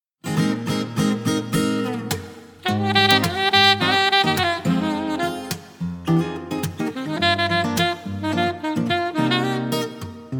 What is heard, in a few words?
Saxophone duo; merseyside